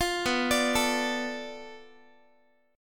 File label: B Minor 7th Flat 5th, Guitar; arpeggiated; 4A,4E,5A,5D,5G#,6C#; 20,19,17,19,x,x